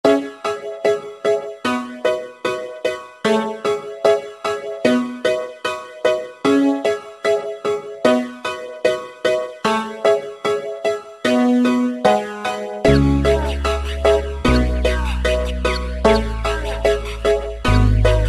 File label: chill beats; Psychedelic Serenity Calm Colors